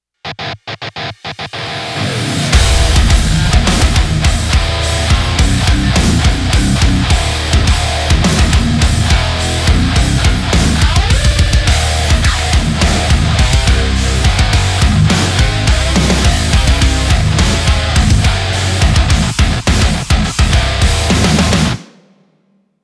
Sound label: metalcore, tones